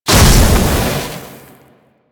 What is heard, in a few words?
Alchemy Vent Turn Off; Warframe; Entrati